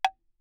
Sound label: granite block, ff; Percussion; hand percussion; graniteblocks